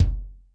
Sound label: DRY K, drum; Crypton - DrumBase5000; DRY KITS